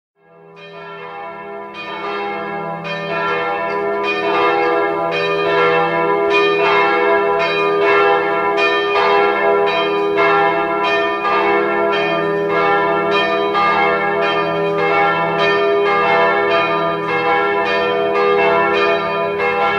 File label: Crkvena zvona Church bells Nature Sounds